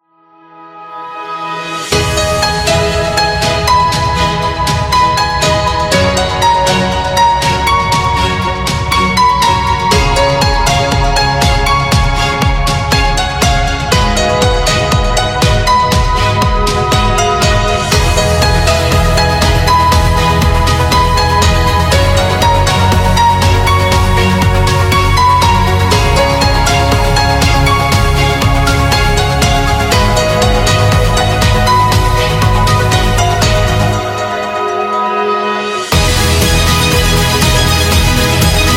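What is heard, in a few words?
Epic Technology, Corporate background music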